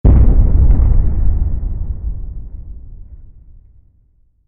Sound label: Monastery Thud; ninjago